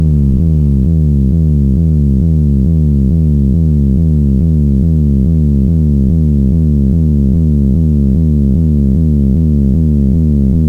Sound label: synth bass